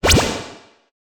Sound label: teleport; minetest teleports wuzzy